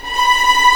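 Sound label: B5 TREM P VL, violins tremolo; East West; The Ultimate String Collection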